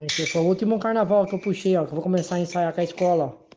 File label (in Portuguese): bateria de carnaval